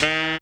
sax; Winds

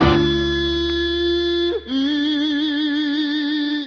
voice eee eee; Loops; Phonky

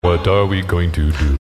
what are we going to do SUNG

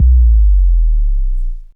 drop bass, kicks heartbeats

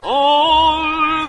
"oh", old woman sung; ooh ahh

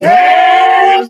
yeah group

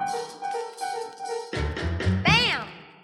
keys, jazz loops

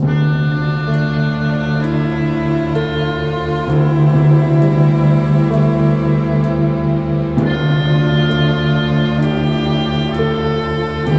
strings, Sad

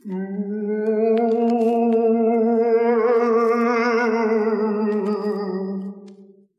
moan

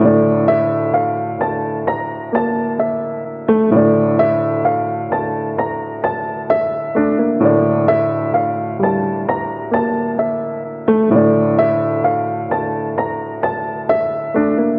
piano, Loops, Sad

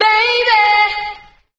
baby SUNG, Voice; ooh ahh

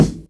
Kicks

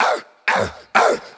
arf arf arf; ooh ahh; human

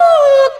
ooh, Voice

